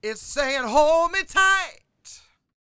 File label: SING W, Vocal Planet Blues & Soul, BLUESY; ILIO